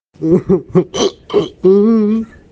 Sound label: aaahhnnn